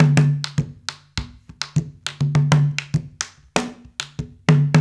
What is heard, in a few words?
Haitian Congo, World Dance